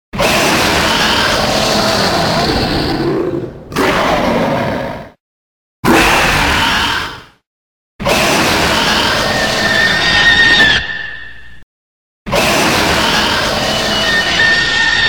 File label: Roar; Galberos